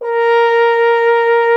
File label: F. Horn; Miroslav Vitous; Solo Instruments VOL-2